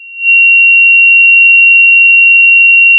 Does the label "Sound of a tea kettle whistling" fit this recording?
Yes